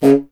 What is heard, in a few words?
C. Bassoon, solo instruments